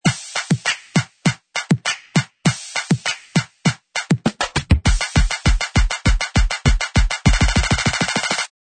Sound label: Tension Buildup Drums 100 BPM; Cymatics